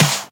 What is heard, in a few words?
Snares; Vengeance Samples; VEH2